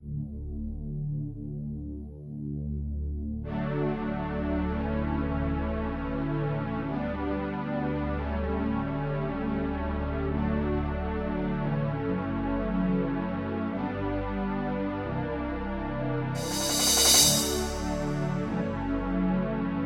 instrumental

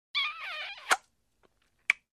Human Long Squeaky Kiss; Hollywood Edge Foley Sound Library

